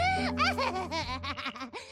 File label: laugh